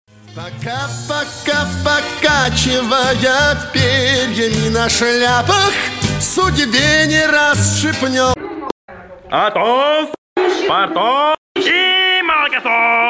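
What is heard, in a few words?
Ringtone, Na zvonok, for a call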